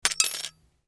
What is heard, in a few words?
coin